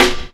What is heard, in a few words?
clean acoustic snare b key, acoustic snare sound tuned to the B note